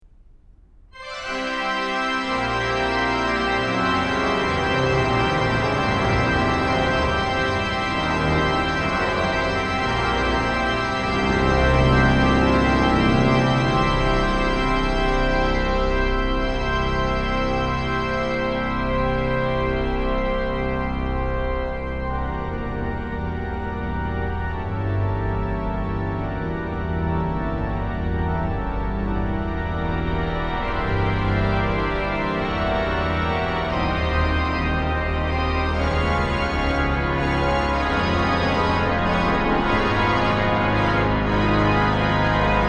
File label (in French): improvisation, cavaille-coll